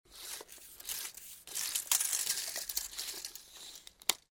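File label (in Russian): измерительная рулетка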